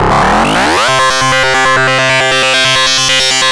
GRUNGE

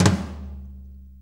6-8 Tom Flam, Drum Loops; Sonic Foundry (Sony Creative Software); Loops & Samples Drum Tools; Flams

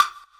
Wood, Block Stick; Equipped Music; Loungin House

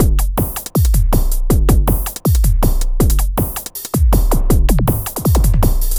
Beat, Drums, Eclectic Electronica

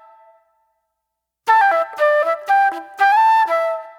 Soul Plate Flute

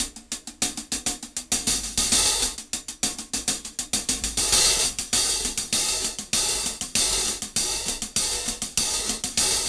KL Beat 98 BPM

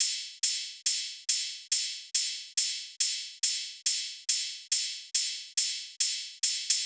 drum Loop 140BPM Full Ride; Vengeance - Essential Clubsounds Vol.5; VEC5 Drumloops; VEC5 Multi Loops 140BPM

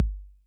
Kick Accent Max Tone Mid Decay, 808

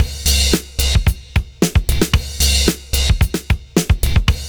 LIKE 808 BD; Univers Sons

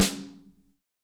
SN AMBIENT, Real Mega Drums

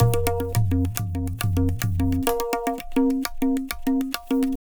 Pure Tabla, 105BPM; Zero-G

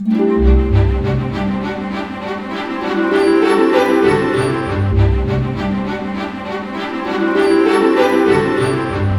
BIKE; East West; Scoring Tools; ORC; Bike Ride C

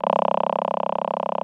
VP-330, String Machines

